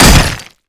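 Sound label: impact stone pillar close; Explosions; Call of Duty Modern Warfare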